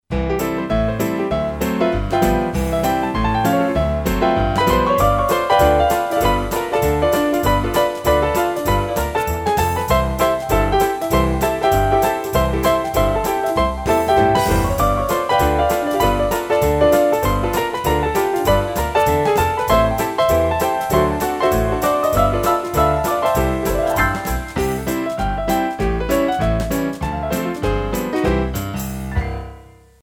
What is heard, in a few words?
classic piano